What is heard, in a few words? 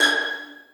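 2nd violins pizzicato g6; Sonatina Symphonic Orchestra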